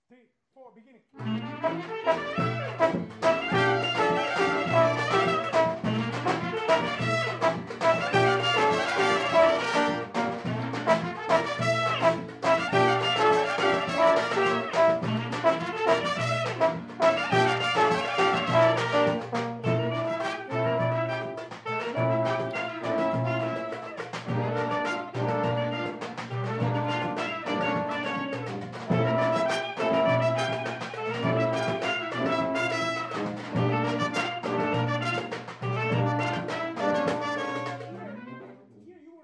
thailand shuffle rehearsal

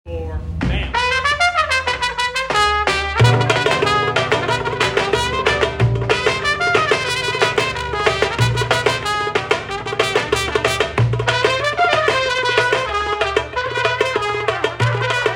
drum thing

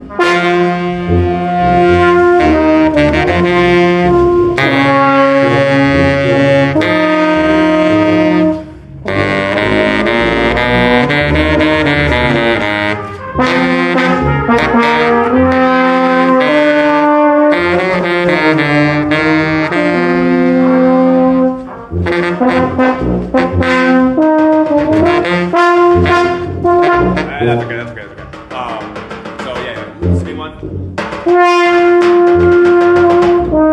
Banicko Midhorns, Rehearsal Recordings

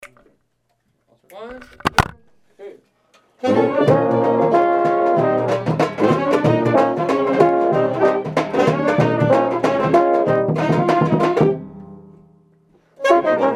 balkan bollywood ending rehearsal

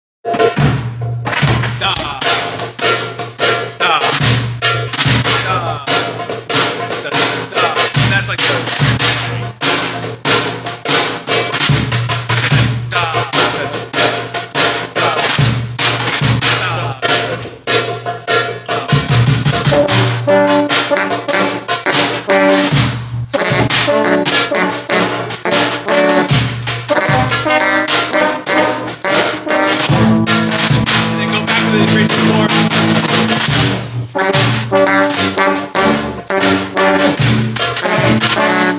Ay balan midhorns C, Rehearsal Recordings

